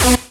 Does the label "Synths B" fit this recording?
Yes